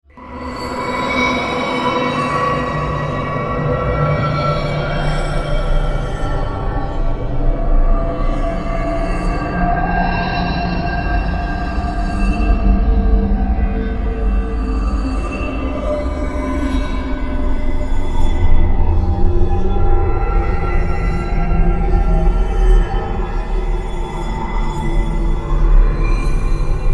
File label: Synth, Stab, Drone, Horror, 5.1